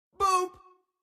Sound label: BOOP; Overwatch Lucio